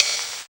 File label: Hat; M8; Electronisounds